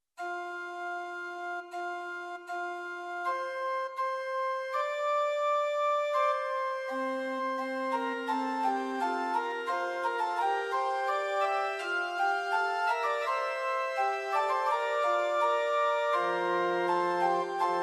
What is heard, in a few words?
Organ Music, Pitch 440, Temperament Werckmeister, Prinz 8 4; Werckmeister 1-3; hymn